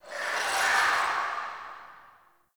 Under City Cavernous Water Lap Rock Distant

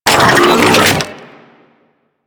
Sniper Reload; DE; Warframe; Weapons; Sentient; Crp Sent Amlg Sniper

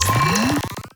Sealed Cache Terminal Wake; Warframe; Zariman

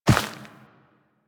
Walk Dirt, Footsteps; Warframe; Operator

